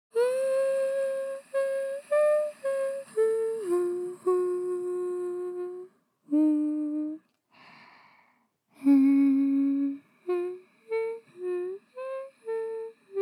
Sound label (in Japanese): 腕枕と子守唄でうとうと（子守唄編）